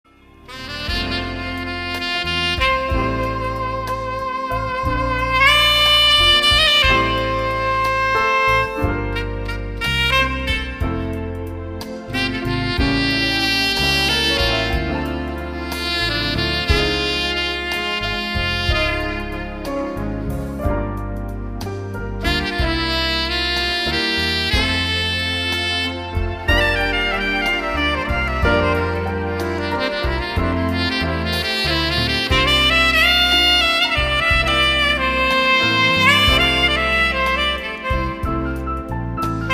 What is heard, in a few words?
Solo sax